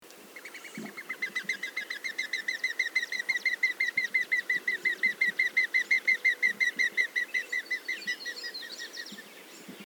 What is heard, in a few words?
Mourning Dove, Zenaida macroura; doves pigeons